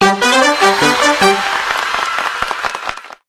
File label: bubble best; game rip; line bubble